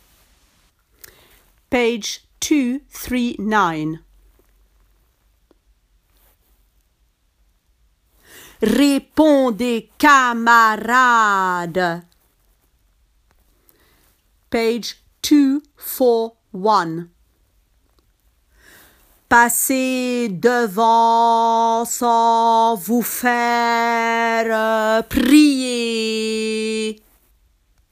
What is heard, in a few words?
tenor; Carmen